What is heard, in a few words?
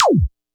kit, Yamaha Motif-ES8